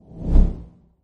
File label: fly; kak priruchit drakona; vysizhivat yajtsa